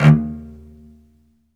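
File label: SOLO cello, Strings; ILIO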